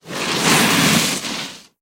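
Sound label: snowboard sounds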